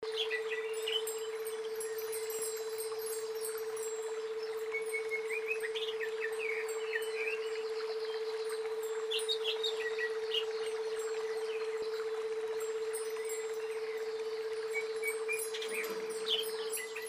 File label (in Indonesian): cucak ijo brainwave